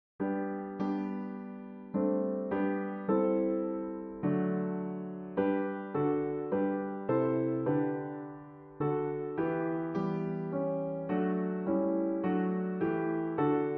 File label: keyboard; o come all ye faithful